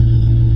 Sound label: Doop